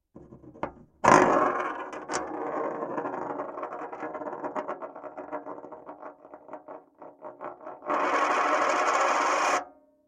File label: Spin Test